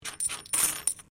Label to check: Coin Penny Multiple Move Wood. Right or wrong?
Right